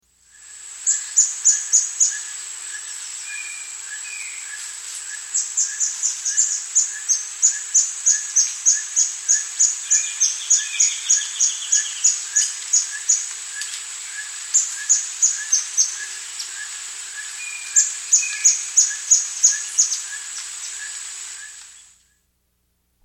Checker-Throated Antwren, Nature's Songs, S. America